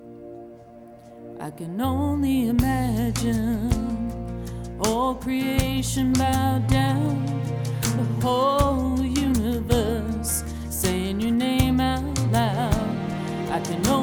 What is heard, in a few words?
girl singer